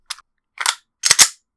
reload

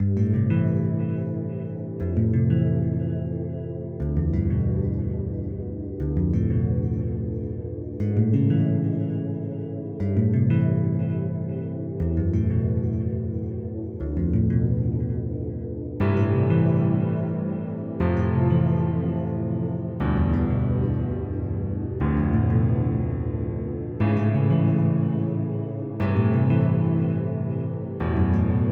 Credits

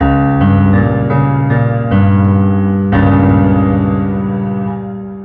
gameover

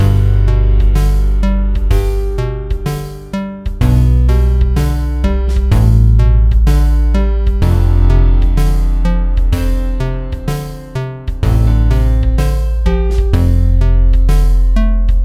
Relaxing Interlude

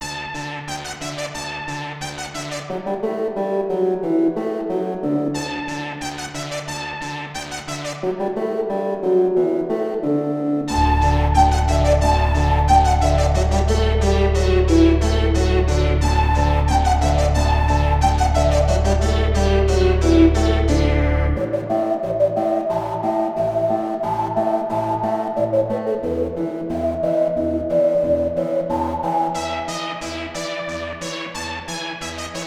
musette; Ambient BGM loops, vol. 1

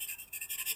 pencil scribble, writing scribbles

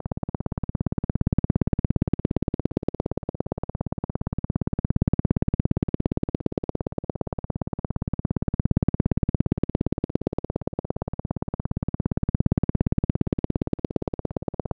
chip chopper

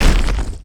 boulder drop

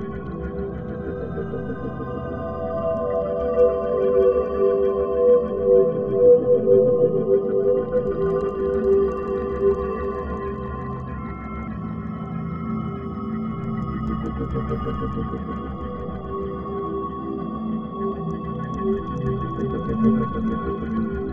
Reverse Time Loop

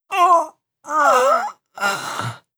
death groan male

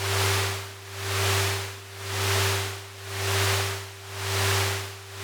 whoosh

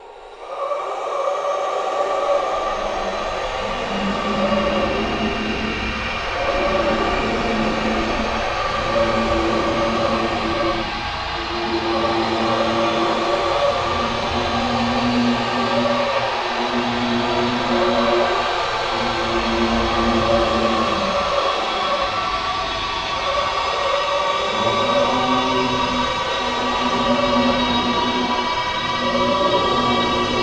dark ambient

